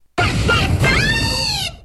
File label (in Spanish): grito; delphox